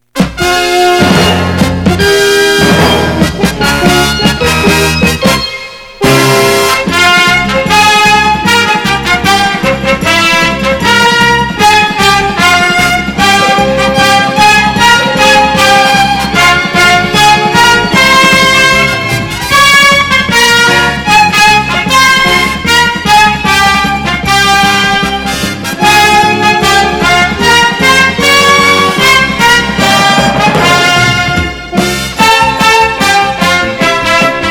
Opening Theme Instrumental; Goldorak; Grendizer; GOLDRAKE

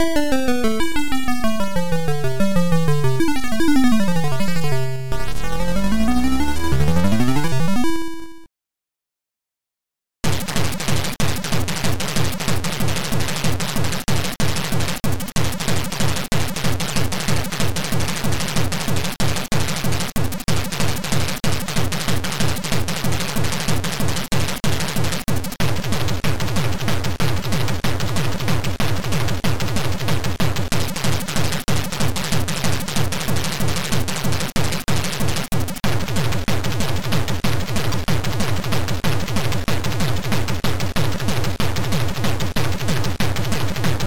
Hard Techno; Sound Orgasm; Chaos; Catloc; ST-128 Module

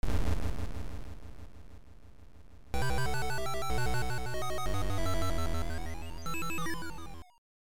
Sub Hunter Jingle; Psytronik Software; Tom & Jerry of GPA; StArkos

